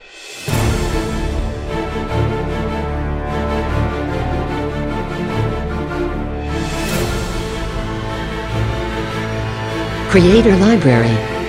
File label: Powerful Epic Trailer, cinematic music